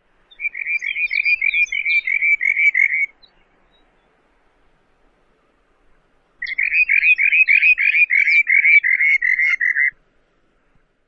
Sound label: song; AK